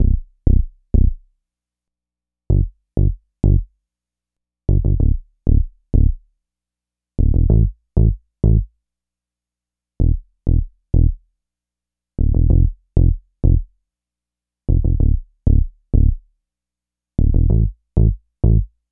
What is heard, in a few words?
Dubby Bass; Dr. Fink's Funk Factory